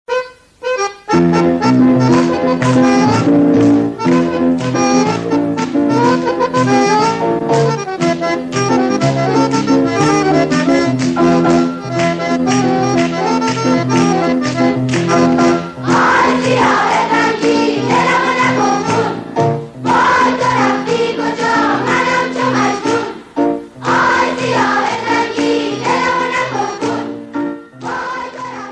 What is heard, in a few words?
animal entry bgm ringtone; bobby deol